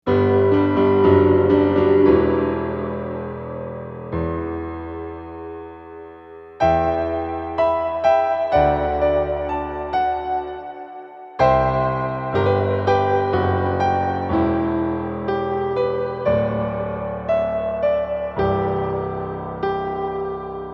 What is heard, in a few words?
hall, bigsky, piano; abletonpiano